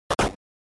RPG Door; SNES

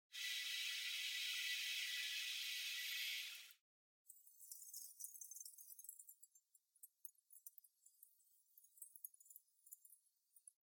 Air Conditioner (Noise)

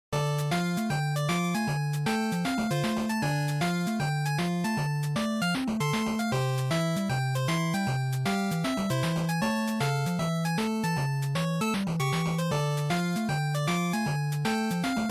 NES Action Boss

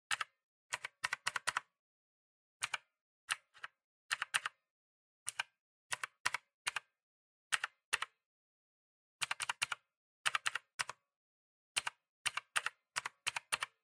PC Keyboard Arrow

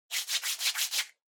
Hand Foley, Rub

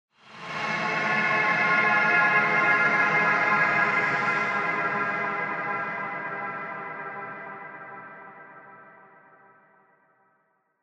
Horror Accent, Low

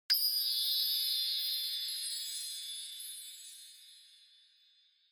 Onoma Sparkle Long; オノマトペ・キラキラ

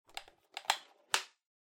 Megaphone Hardware Battery Cover Close

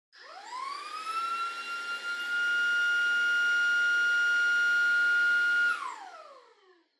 Vacuum Cleaner Far Vacuum High Mid; 掃除機; 遠い バキューム 高 中

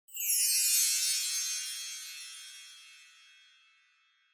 Wind Chimes Reverb Fast Down Low

